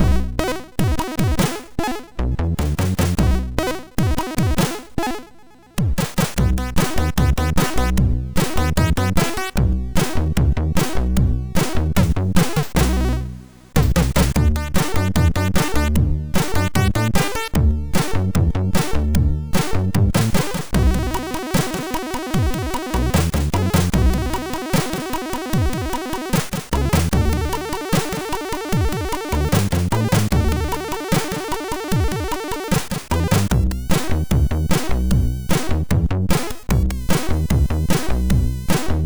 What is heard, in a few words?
sid, MOS6581; Jakkie Jam; hvsc